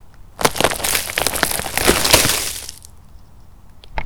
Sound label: cracks mono